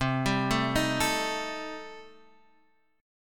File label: C Minor 6th, chord